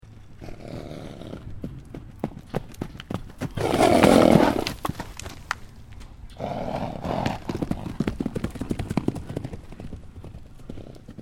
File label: Horse Excited Trotting And Prusting; Horse trotting in from right, breathing excitedly, agitated, then galloping off to the right.; Horse Sound Effects